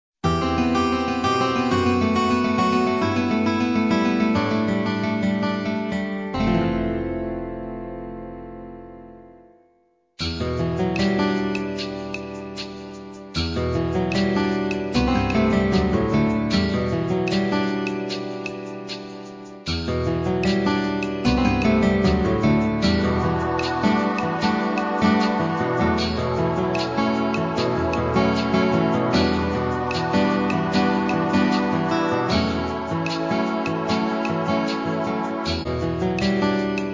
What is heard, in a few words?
gameover; racing lagoon